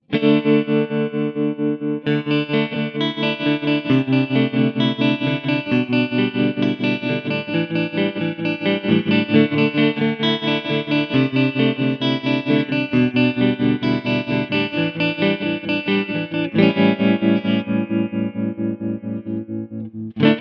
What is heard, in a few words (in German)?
Crazy Tube Circuits White Whale Effektgeraet Trem Tube Rev Medium